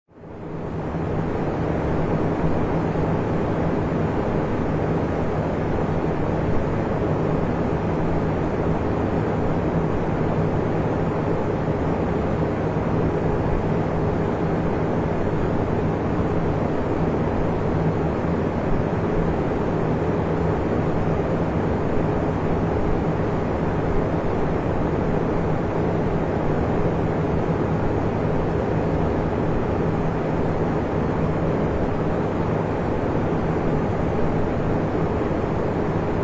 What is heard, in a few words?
FAN WHITE NOISE; Fall asleep, stay asleep (11 Hours)